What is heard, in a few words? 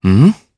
sigh; voices; heroes; Kasel; kingsraid